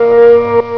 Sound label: buzwarn